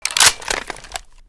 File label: ak47 inspect empty release; Tacz1.1.5+; RSWS 1903